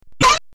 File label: Prick Sound Effect; Tom and Jerry